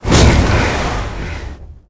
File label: phoenix fire; smite; npc